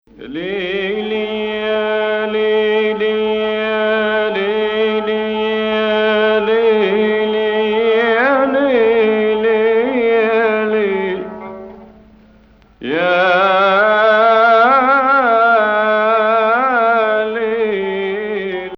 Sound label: Hijaz Octave